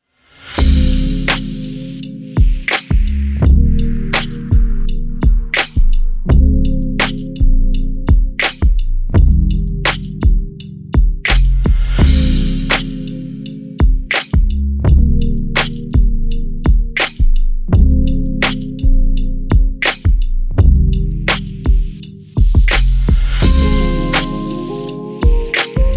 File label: Lofi Hip-Hop Chill Beats